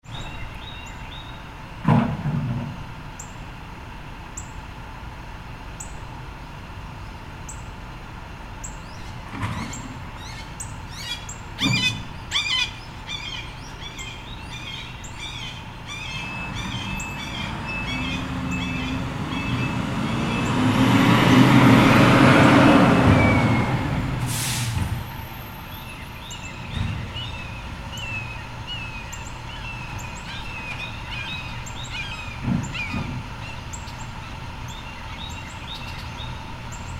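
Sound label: Ambience Recycling Truck Birds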